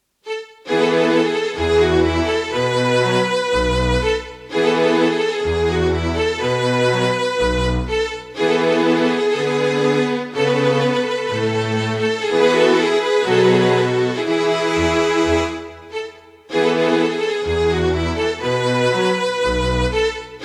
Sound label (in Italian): Minuetto in Mi Maggiore